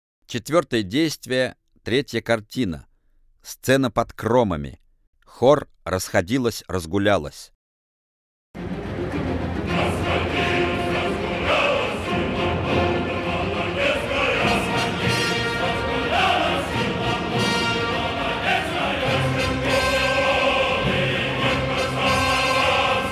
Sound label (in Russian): Мусоргский Опера Борис Годунов. Сцена по Кромами. Хор Расходилась, разгулялась.